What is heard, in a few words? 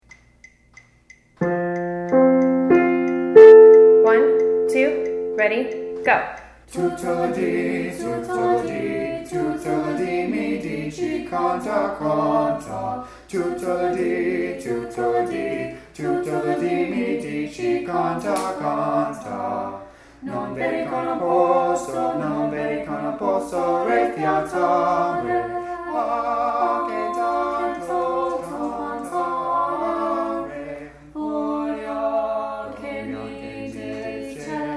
tutto lo di soprano audition, choir